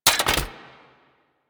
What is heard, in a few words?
spear throw mech; Warframe; Weapons; Corpus; Spear Gun